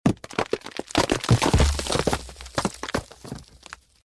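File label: wooden structure being broken apart; Удары и разрушение; топор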